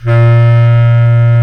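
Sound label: bass clarinet, Orchestral Winds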